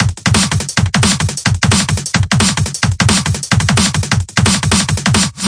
175 eyeballs wiggling meth lab, drum loops and breaks; 161 to 180 bpm